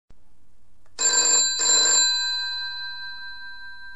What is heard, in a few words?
English Phone, alarms